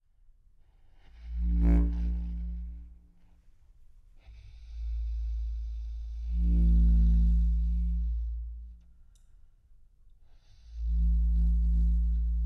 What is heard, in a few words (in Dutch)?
Lage berggeluiden